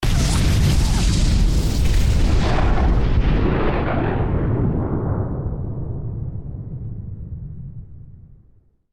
big explosion

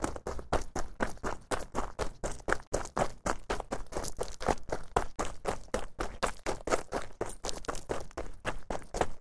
dash gravel

